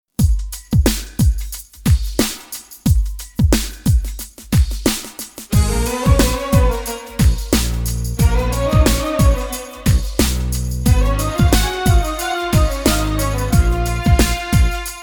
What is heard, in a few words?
instrumental; persian